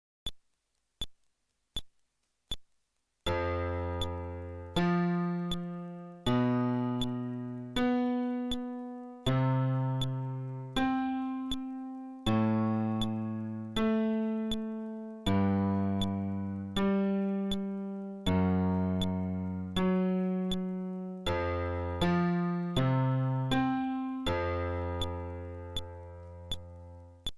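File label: Arpeggio テンポ80, フレットレス bass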